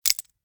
Gadget, Motors & Switches; 1001 Sound Effects

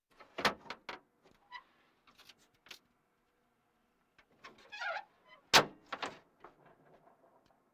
Military vehicles

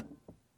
car, impulses; NS Studios - ultra sound collection

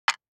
DICE on Wooden Tray, Drop, Standard, 5 Five Dice; Samples; ArticulatedSounds; DICE Sfx Party (Extended)

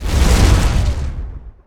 Rumble Land Mass Fall Away; Destruction

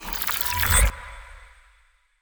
Ice Spell Utility Unlocking; Arctic Aura

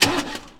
Auto Ignition Quick Turn; Automobiles

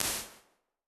SNARE REVERB 2000, Impulse Responses; Reverbs; PastToFutureReverbs; CLA; IRs

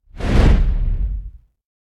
Whoosh Harsh Hit Impact; Hollywood Edge; sound designer's toolkit v2

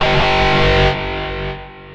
Scifi Alarm Loop; SFX Kit; Sci-Fi Loops

